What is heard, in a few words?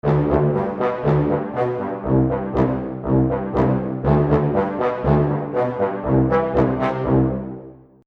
Seamless Music Loop Brass; Musical